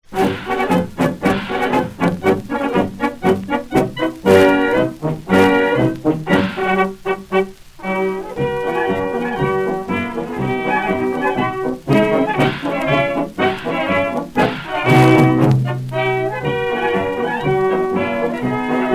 Marsch

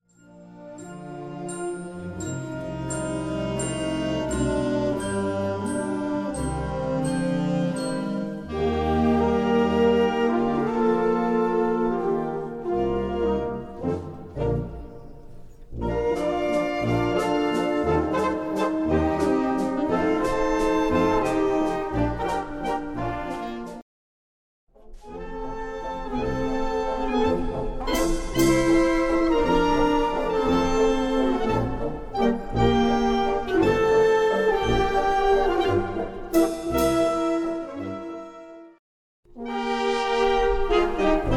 Wiener Walzer

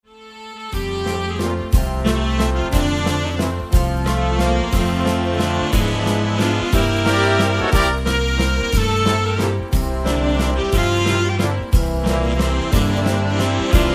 Walzer

